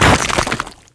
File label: corpse explode